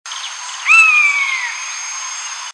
buse montagnarde

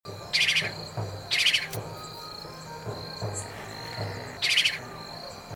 cheveche brame, chant

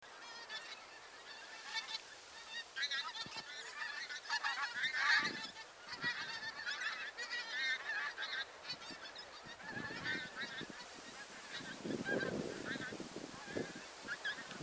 oie a bec court, chant

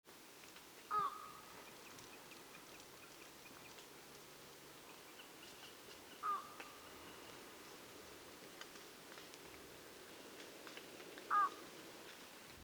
butor etoile, chant

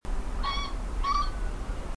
faisan de colchide, chant

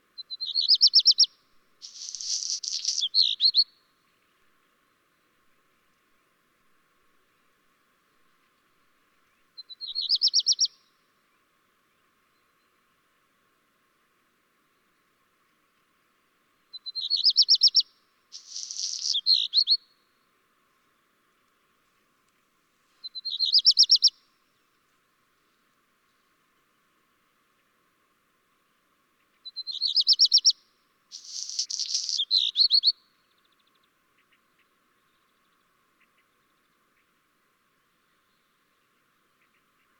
rougequeue noir

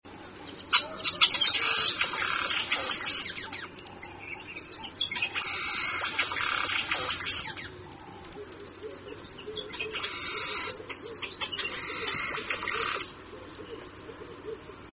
tisserin a lunettes, chant; Tisserin à lunettes par Franck Brunelière

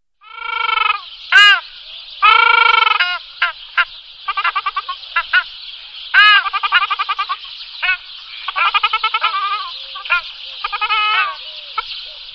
tadorne casarca, oiseaux chant

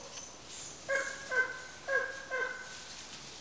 dindon sauvage, chant

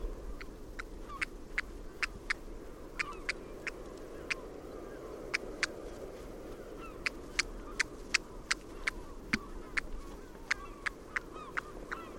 engoulevent d europe; chant

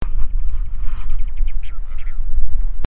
brassemer cendre

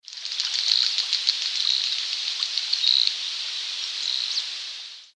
sarcelle bariolee, chant

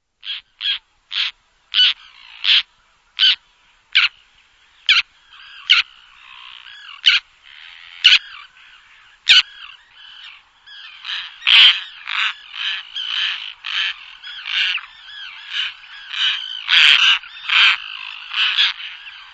sterne de dougall, oiseaux chant

